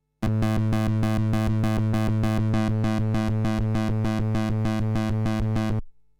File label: Moog nickel vintage; Warm Audio TB12